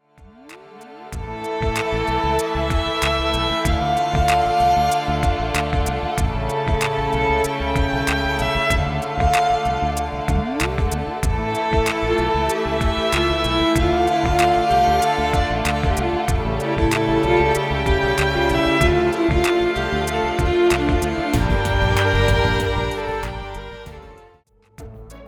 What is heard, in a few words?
Hip Hop